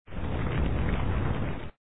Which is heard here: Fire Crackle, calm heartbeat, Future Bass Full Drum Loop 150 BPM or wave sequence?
Fire Crackle